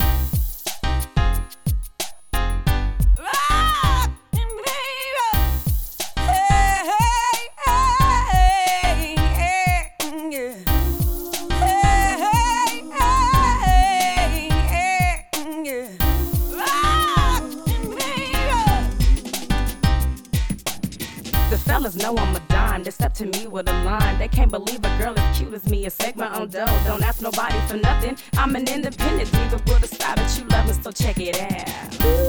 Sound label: RnB